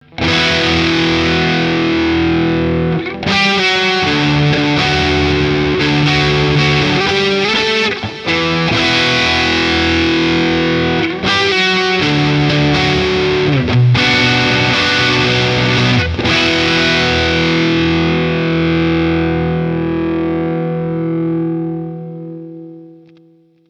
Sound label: Lead digital Delay Spring Reverb; Boss Katana Artist